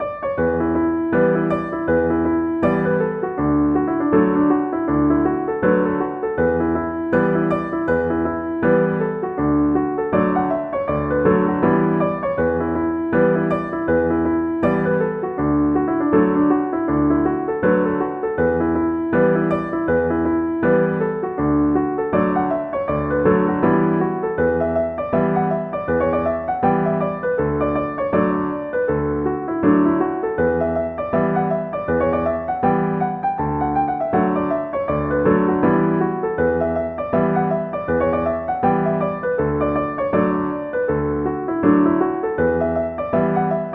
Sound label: pigeon roof on piano